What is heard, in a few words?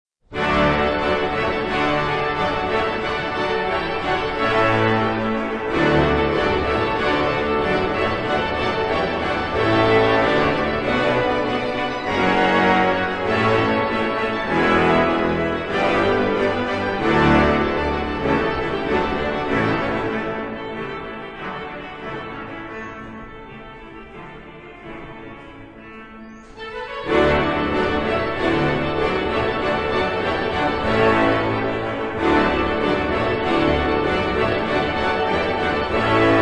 Fanfare